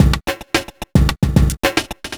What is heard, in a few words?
evil beats 110